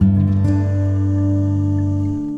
Guitar And Synth

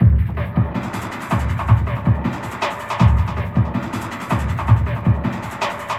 Drumloops, 160bpm; Drum Bass; Zero-G; Total Drum Bass

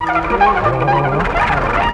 Kaos FX, Ambient Grooves

